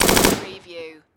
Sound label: Machine Gun Bren